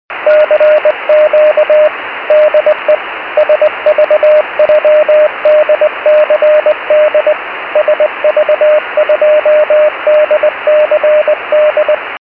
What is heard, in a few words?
70200khz; pe1itr; 70mhz